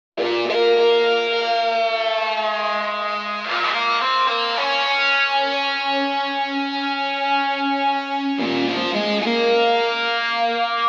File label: Electric Guitar Riff Processed with Wah Wah